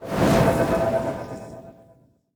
warlock summon channel

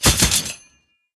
inferno oni ground Swords

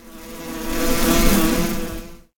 wasp

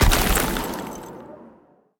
winter Age cast

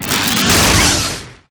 cristalize cast; spiders tower heode

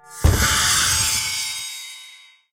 hero nivus magic missile summon

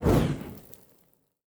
transform To Normal; tenshi

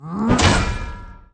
mighty

